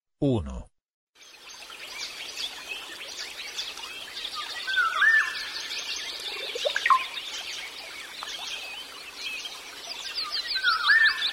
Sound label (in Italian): intensità; esercizio; Sonora vol A